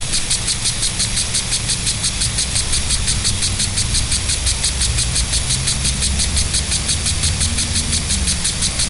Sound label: lawn sprinkler